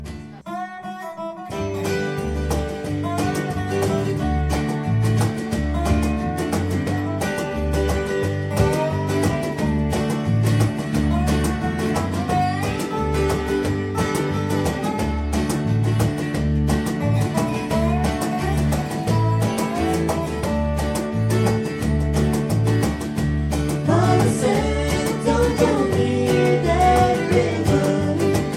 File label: cajon